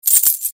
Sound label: coin